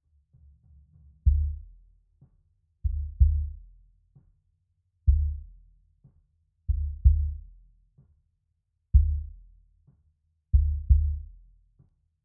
Sub

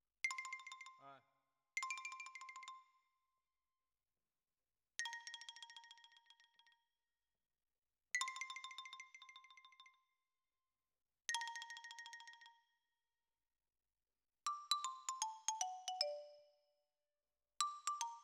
Xylo Close

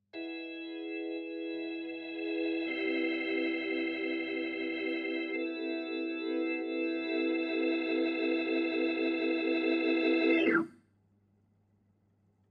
Hammond

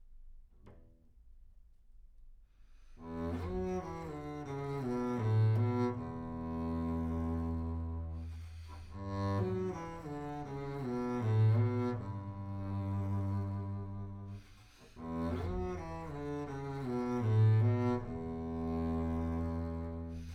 Bass Room

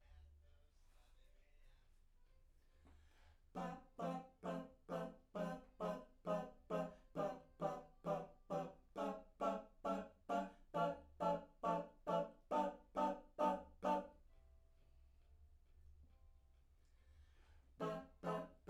Backs; SCHOEPS

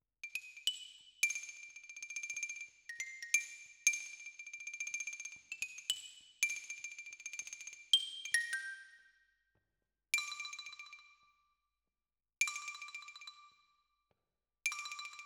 Xylo

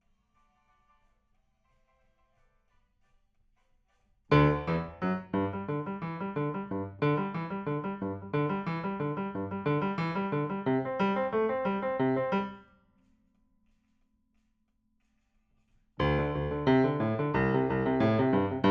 PIANO, RNR for piano

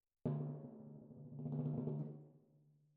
Timp Close

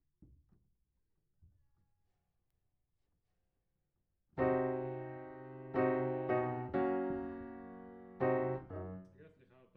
Piano

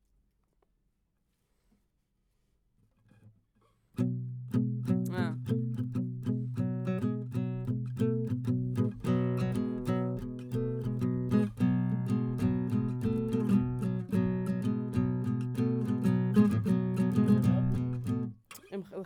GTR

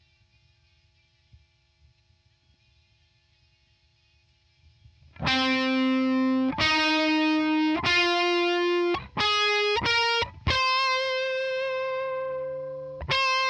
Guitar 2

